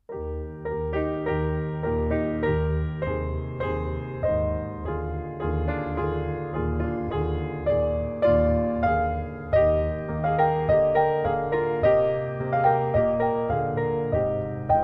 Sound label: Sheep May Sfely Graze Accomp, trumpet; Hal Leonard - The Canadian Brass Book Of Easy Trumpet Solos